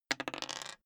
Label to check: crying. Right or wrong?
Wrong